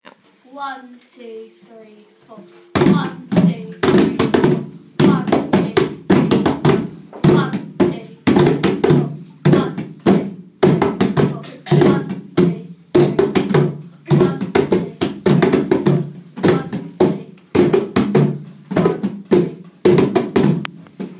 rhythm composition with drums

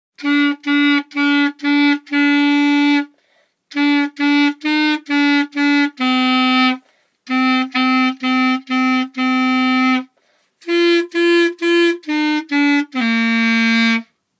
One Man Went to Mow on clarinet

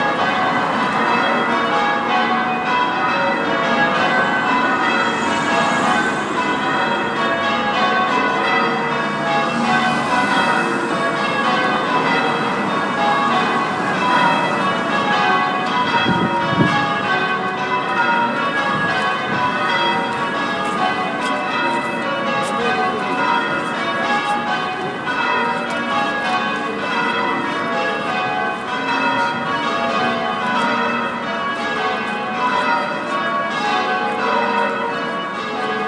christchurch cathedral bells

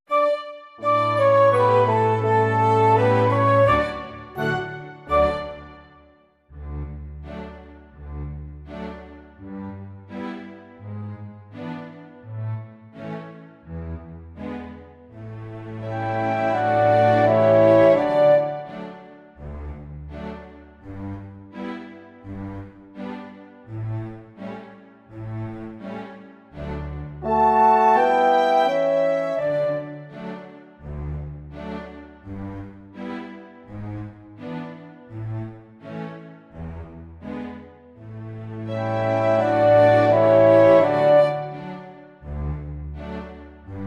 backing track

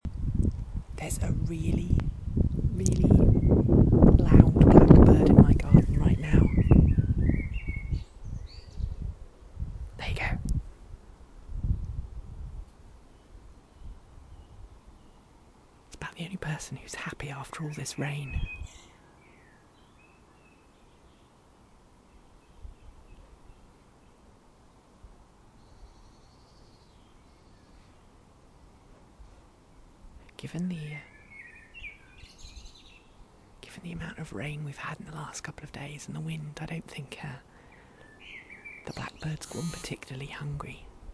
happy blackbird

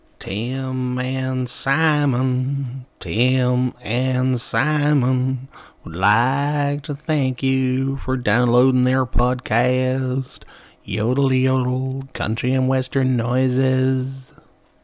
country and western

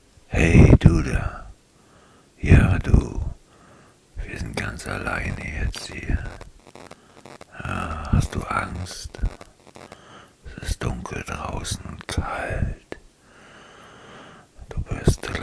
horror

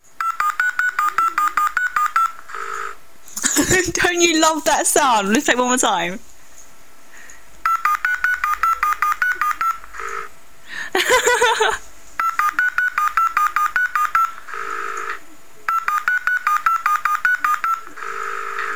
ring tone

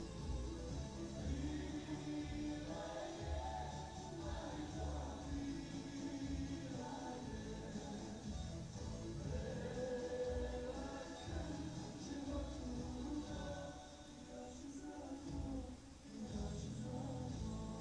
noisy neighbour boo

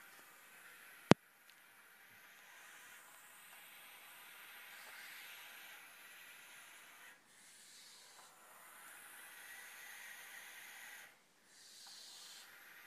barn owl owlets hissing in the dead of night

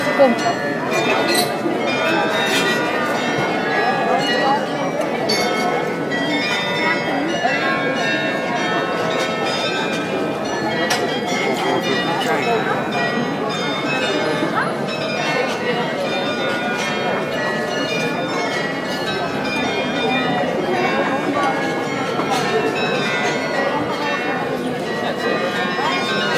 carillon dom church half hour

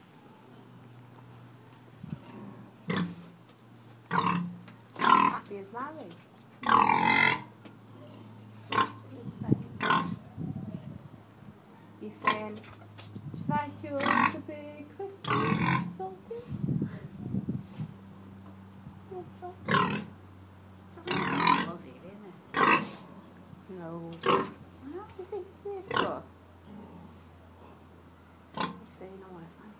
oink

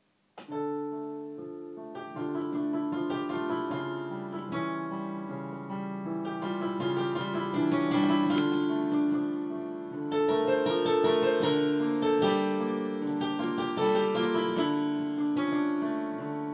piano improvisation

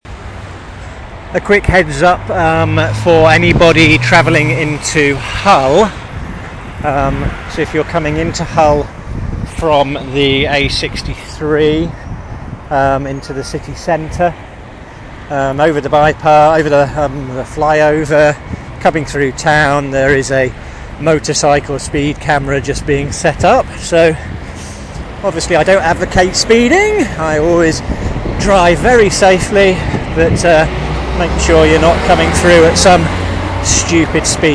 speeding

